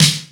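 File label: FM snare drum; East West; Global Sounds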